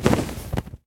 wings, enderdragon; mob; Minecraft

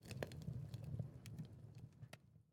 furnace fire crackle; Minecraft

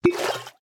fill, bottle; Minecraft

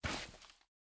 walk, turtle; mob; minecraft

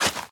shovel flatten; Minecraft

